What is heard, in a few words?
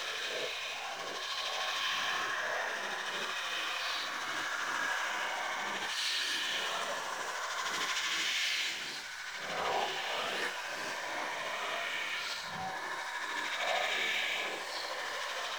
Reaper Active Loop; Warframe; Wraith